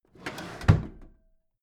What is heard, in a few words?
packed drawer smooth clatter close heavy